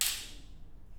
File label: hall impulse; 講堂6